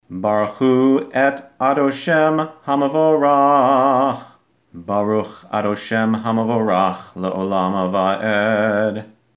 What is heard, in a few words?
Barchu; Weekday Maariv (Slow); Tehillat Hashem Nusach Ha-Ari Zal Siddur